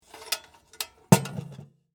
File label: Lantern Set Down; Foley; Set Downs (Sound Effects:Objects)